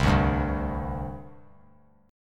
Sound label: C Suspended 2nd, C, down, chord; Csus2 strummed